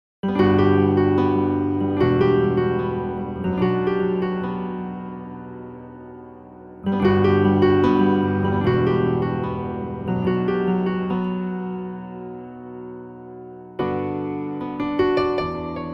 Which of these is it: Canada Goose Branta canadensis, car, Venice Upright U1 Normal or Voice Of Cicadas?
Venice Upright U1 Normal